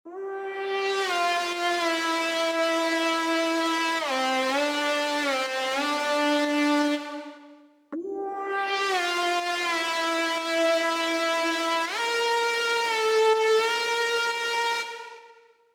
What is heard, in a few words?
Crispy lead; FOCUS Preset Pack